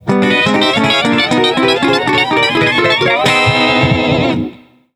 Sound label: Blow up